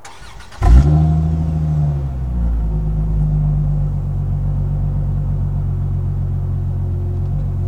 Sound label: Chrysler 300M Start Loop; Automobiles